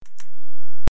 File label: Cell Phone Open